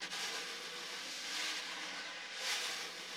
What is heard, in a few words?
Snare Drum; Q Up Arts; Johnny.C's Vintage Snare Drum; 5.5X14